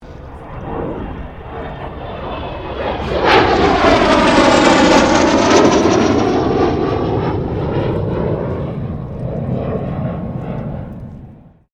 1997 BAE HAWK 127 PASS; Airplanes; Sound Effects:Vehicles

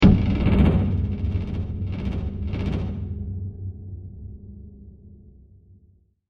Heavy Mangled Drum Stab; Impacts; Stutters

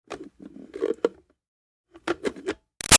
Switch Plastic Bubble Machine On Off Slow; Switches; Objects

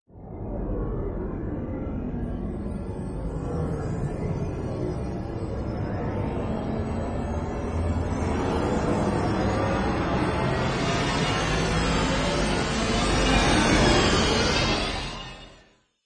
Synth Intro Em 98, Synth Loop In E Minor At 98Bpm; Royalty Free Synth Loops

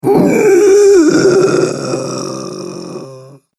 Classic Zombie Breath; horror; Zomby

